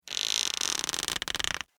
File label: Squeak Creak Stress Slow; Foley; Squeaks; Objects